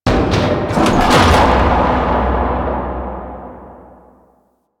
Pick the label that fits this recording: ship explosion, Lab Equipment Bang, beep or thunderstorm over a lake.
Lab Equipment Bang